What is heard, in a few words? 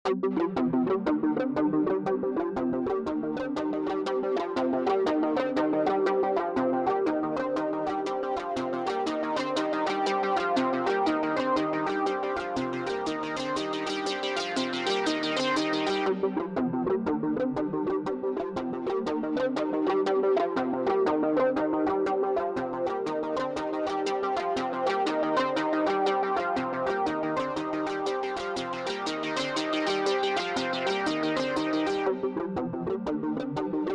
signal no kick